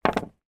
sport pool snooker x3 balls place in rack triangle